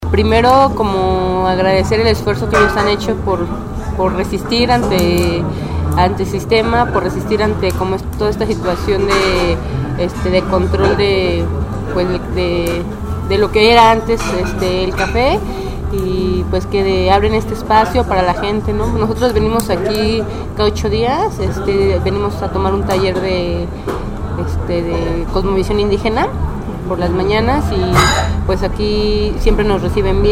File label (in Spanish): comensales; cafe victoria